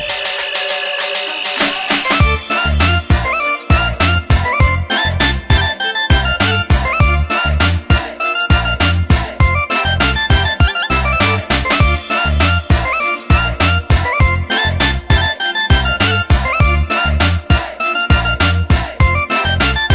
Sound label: random tone ringy